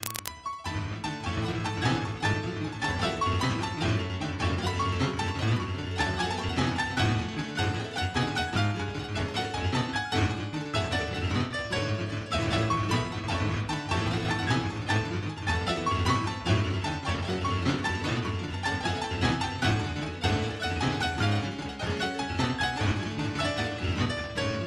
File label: piano ver